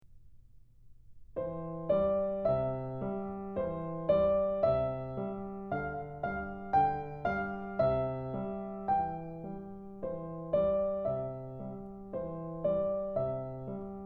Lullaby; Keith Snell Level 00 Etudes

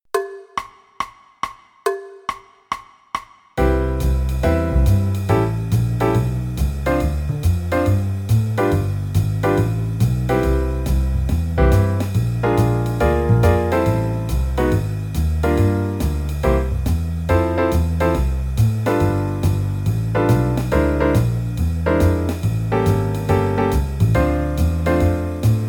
Play-along, head only, qn=140; Jazz Piano

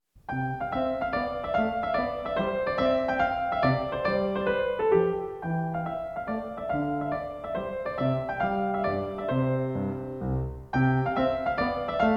Menuet En Rondeau; Magrath Book 03

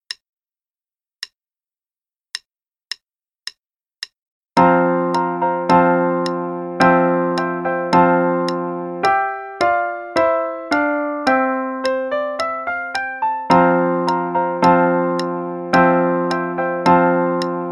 Roman Trumpets, both parts, qn=108; Faber Adult All-in-One; Adult Book 1 (All-in-One)

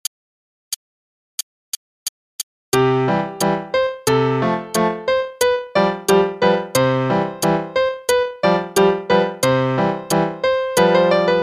Trumpet Concerto (qn=180); Faber; Adult All-in-One Book 1

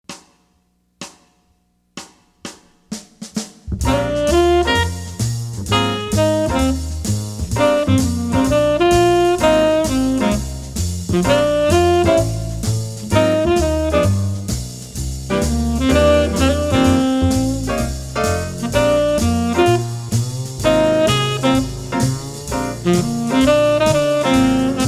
Shuffle; 15 Easy Jazz Etudes; CM Level 2 Solos (jazz)

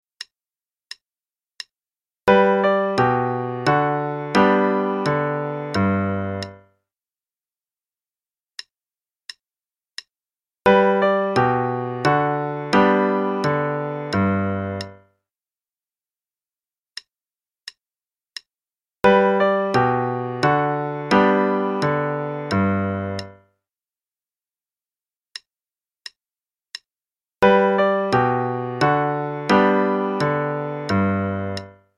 Minuet in G Major (qn=88); Bach

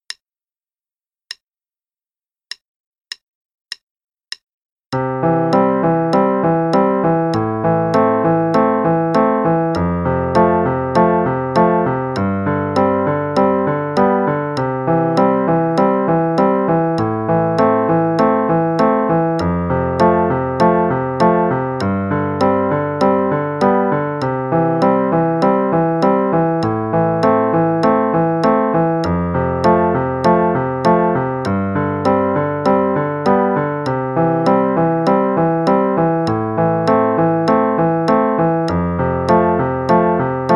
Improvise in C Major, qn=100; Level 2B; Let's Improvise in C Major (4x; Faber Improv

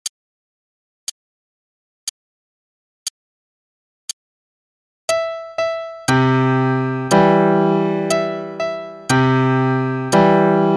Brahms' Lullaby, qn=60, broken chords; Adult Lesson L2

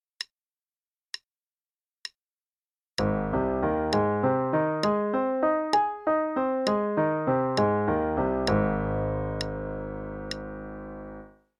Arpeggios, Ab Major (qn=66); CM Level 6

